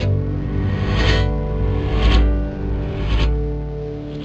Processed Guitar, Loops; Guitars

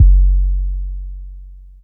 Kick One Shot, Drums

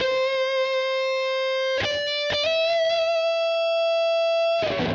Electric Guitar, Loops; Guitars

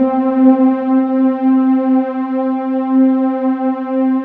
WAVE PAD; SoundFactory

